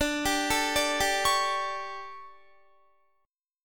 D-Major 7th Suspended 4th, D, Guitar, 4E, 4A, 5D, 5G, 5C, 6F; arpeggiated; 22,22,19,19,19,20; chord